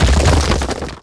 wall crumble

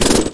mg-3 fire

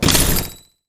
thunder flash fire

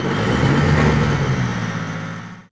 nod avenger stop

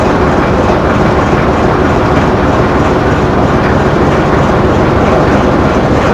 tank mammoth idle

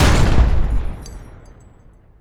mammoth fire; gdi

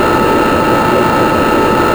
minigun loop

